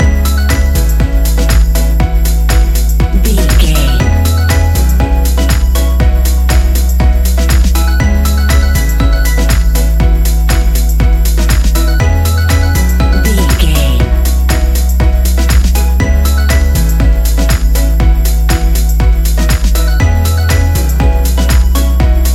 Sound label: A fast paced piece of club music; A fast paced piece of club music perfect for the nightclub, and a youth orientated visual scene.